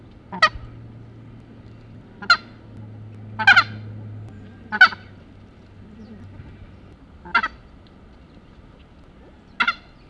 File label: Anser canagicus; Emperor goose; The call-over of two birds with loud calls